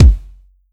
Kick, 909 Kicks

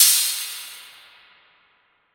cymbal silver; Drum One Shots; SD MIDNIGHT Sample Pack; Slate

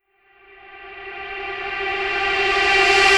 reverse, vocal sample

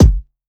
kick aggro, Hip-Hop; Drum One Shots; SD CAICOS Hip-Hop Sample Pack; Slate

